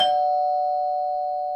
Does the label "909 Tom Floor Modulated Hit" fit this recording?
No